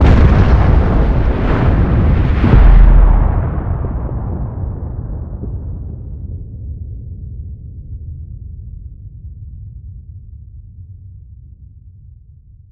EXPL planet; weapons; starruler

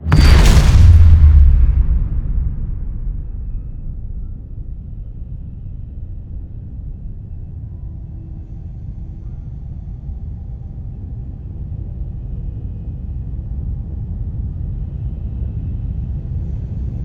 EXPL star explosion; starruler

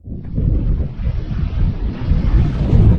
core implosion charge; starruler

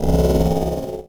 alert lost asset; ui

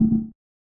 order move; orders; starruler

